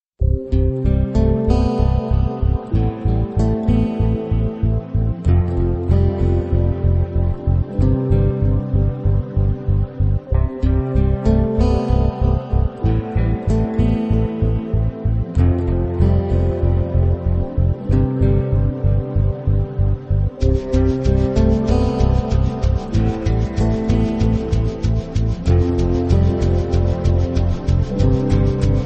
slow guitar beauty

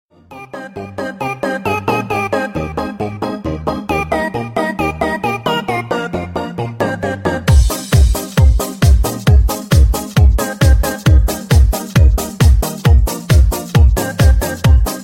disco dance

